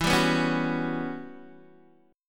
E Minor 6th Add 9th guitar chord, Open D

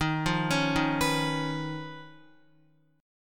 Eb 7th Suspended 2nd Sharp 5th Eb, Guitar; x,6,3,6,3,6; Eb7sus2#5 arpeggiated; JGuitar

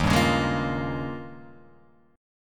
Eb 11th, chord; strummed; JGuitar

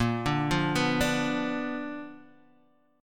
B-Minor Major 7th Flat 5th Bb

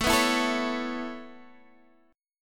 B 7th Suspended 2nd Suspended 4th A

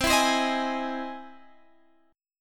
E Augmented Major 7th C

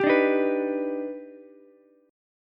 D sharp Augmented Major 7th D sharp Ukulele; strummed; 12,14,11,14; JGuitar

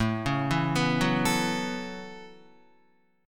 A 6th Add 9th A down Guitar; A6add9; arpeggiated

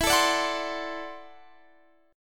D 7th E, guitar; D7/E; strummed

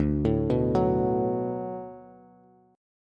D 6th D down Bass, chord; D6 arpeggiated; JGuitar

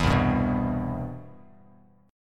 C Major 7th, Bass Standard; CM7 strummed

